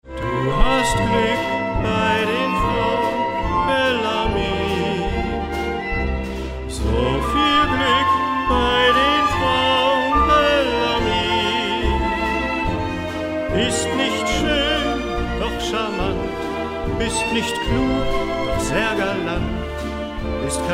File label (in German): Gesang